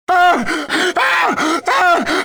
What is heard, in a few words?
writhe, pain death, male; Soldier Of Fortune II Double Helix; XBOX